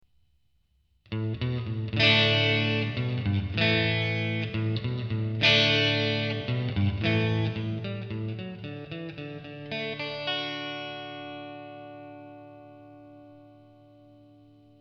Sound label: clean reverb, guitare